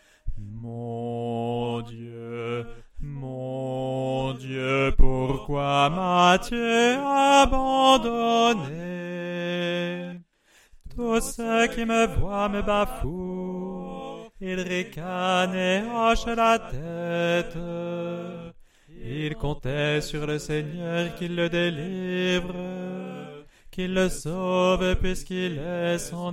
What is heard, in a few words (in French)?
ensemble S; Soprano